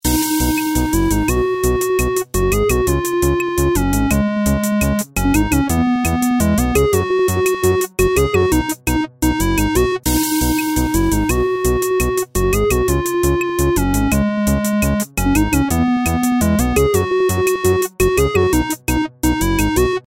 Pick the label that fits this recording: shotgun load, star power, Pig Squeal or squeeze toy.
star power